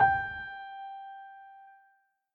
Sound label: Piano